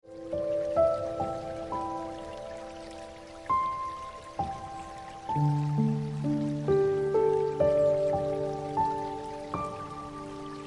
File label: Ringtone Piano Music